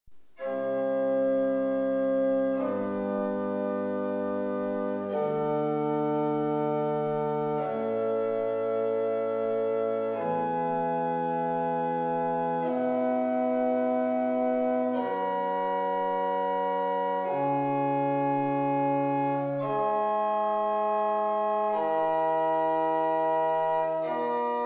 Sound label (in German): orgel